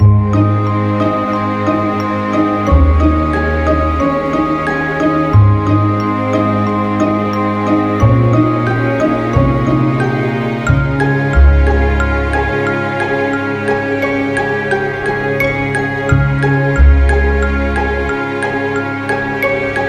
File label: christmas night